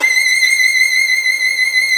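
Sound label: VIOLIN VIBRATO; Sound & Vision; Gigapack I CD 2; Violins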